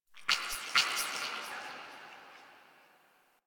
Sentient Lair Spirit Chatter, Ambience

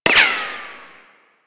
Sniper Ricochet; DE; Warframe; Frumentarius; Exalted Sniper

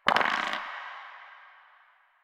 Dice Roll One; Warframe; Koumei

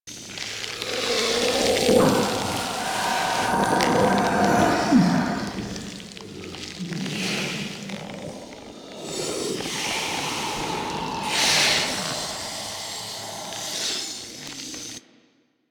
Lair Floating Rock Pillar Loop; Warframe; Ambience; Sentient

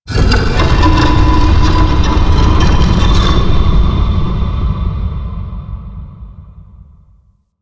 Twisted Stone; Warframe; Man In The Wall

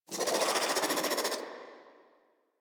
Infested Crawler Attack; Warframe

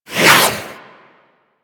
Boomerang Whoosh

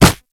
Kubrow Run Snow, Footsteps; Enemies; Warframe

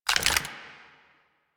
Clip Chamber, Weapons; Kraken

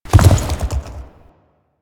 Horse Jump Land Heavy; Foley Actions; Warframe; Vehicles; Duviri

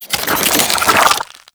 Infested Weakpoint Retract; Warframe

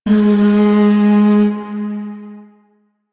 Shawzin Oct One G Sharp; Warframe; Zariman

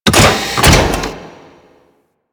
Floor Spawner Open; Warframe; Corpus

